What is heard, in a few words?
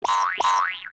Sound duck